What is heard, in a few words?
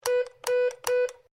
MORSE CODE O; Morse code aplphabete; Morse Code (Sound Effects:Electronics)